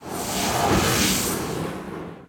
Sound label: Guardianspirit Spawn